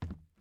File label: plastic step